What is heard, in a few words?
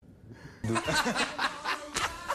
laugh